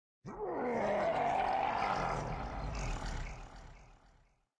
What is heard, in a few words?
ferry, lake